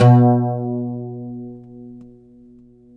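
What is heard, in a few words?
pluck OUD B; Roland - LA Composer vol. 3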